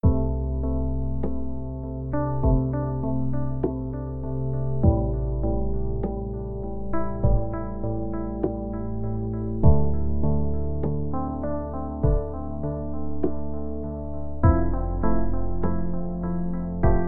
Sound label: crystal, ambience music; PhoenixCraft 1.16.5